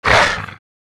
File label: Dmg; Crohell; Fly For Insanity